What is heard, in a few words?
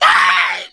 death, voice; hunter